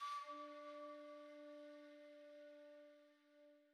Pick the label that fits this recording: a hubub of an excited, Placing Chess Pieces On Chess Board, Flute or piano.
Flute